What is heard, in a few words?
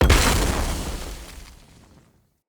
Fire Blade Projectile Impact; Characters; Enemy; Abilities; Sinecure; Banneret